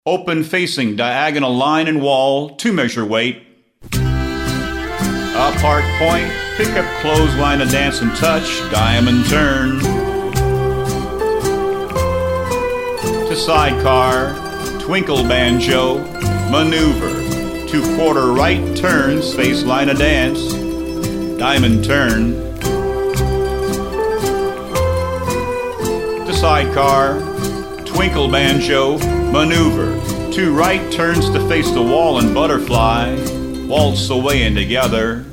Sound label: vocal sample